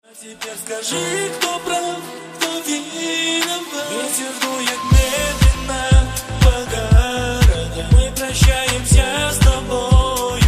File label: duet